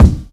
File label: clear kick drum sound d sharp key; Duration 0:01; Channels Mono; kick drum samples